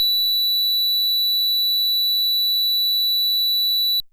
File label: FM Sq Dist DX100 B6; square